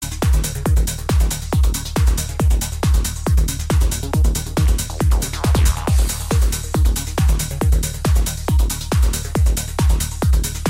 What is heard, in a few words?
rock background music tone